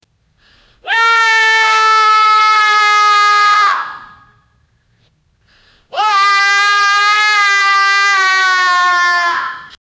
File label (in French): cri, screaming; cri de freddy dans five